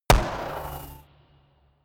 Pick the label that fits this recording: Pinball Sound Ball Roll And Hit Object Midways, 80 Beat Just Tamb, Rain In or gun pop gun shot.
gun pop gun shot